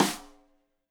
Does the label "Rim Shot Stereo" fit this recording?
Yes